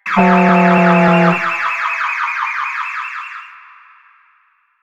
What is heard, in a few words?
Large Vehicle Alarm Destroy; Warframe; Ambience; Nineteen Ninety Nine